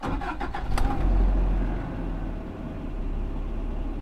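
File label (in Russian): новый надежный рабочий двигатель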